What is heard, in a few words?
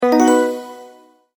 multimedia tone advance; McKinney Sound